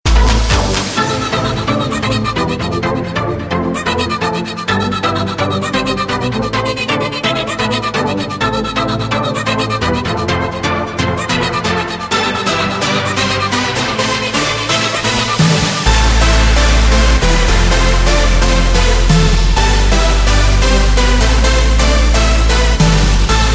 trance sms

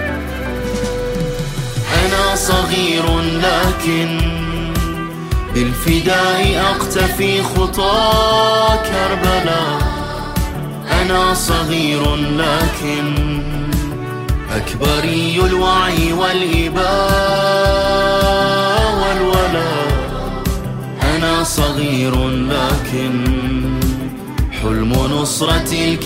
Arabic, ringtone religious

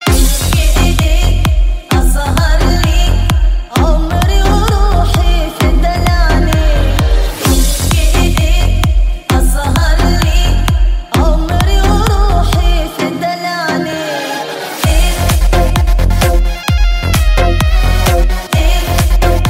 Arabic ringtones

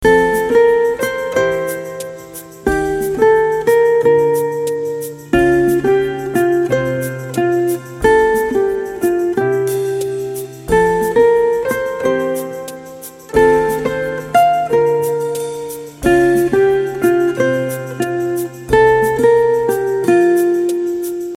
calm guitar music

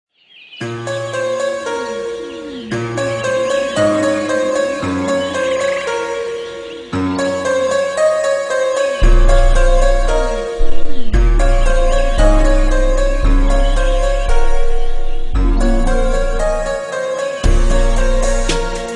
bass i love u

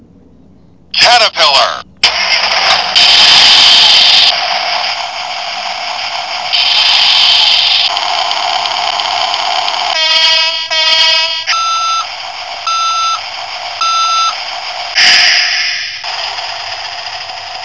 caterpillar ringtone

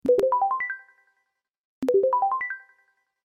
apple bubble, ringtone

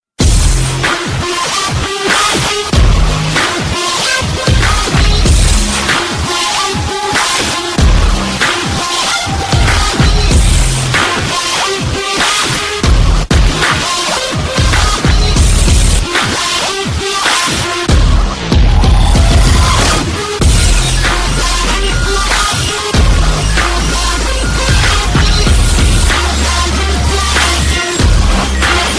best bass

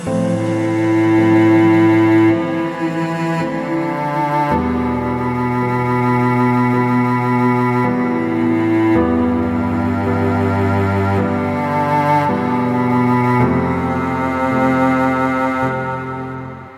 love sad

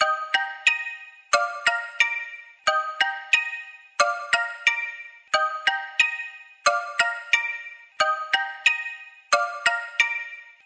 Morning, ringtone; xiaomi

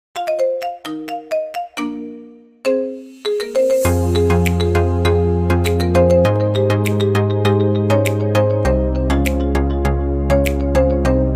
remix; apple

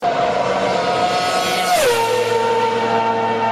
notificacao; f1 spa

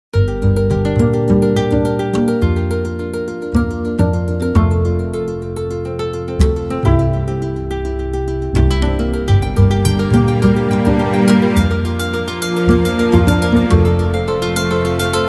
htc butterfly 2013, ringtone

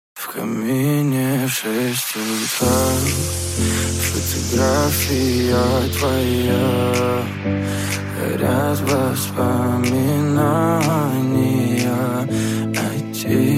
relax ringtone

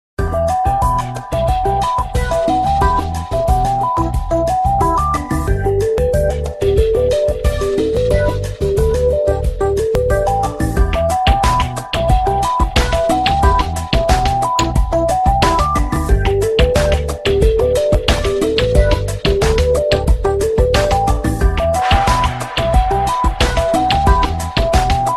google nexus 7, ringtone

